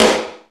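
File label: snare b key, snare drum tuned to the B note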